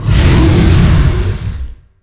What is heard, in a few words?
pain, dragon